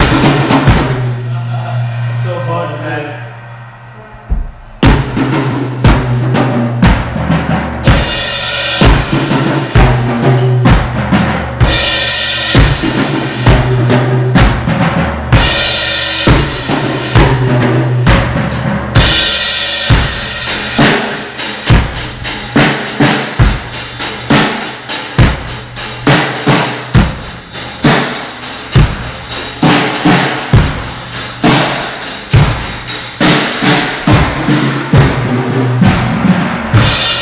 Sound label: on drums